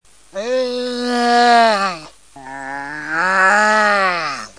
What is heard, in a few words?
seal yawn